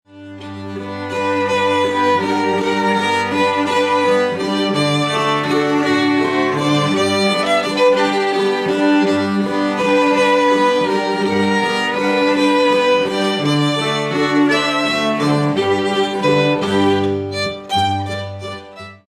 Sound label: waltz contra